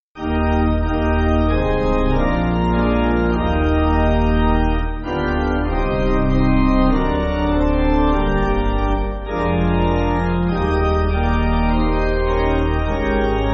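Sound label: Pipe, Pipe Organ, Music Backing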